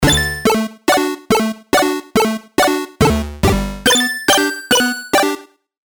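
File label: Serum, pluck; Bad Luck; CHIPTUNE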